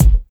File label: studio bass drum single shot a key, Royality free kick tuned to the A note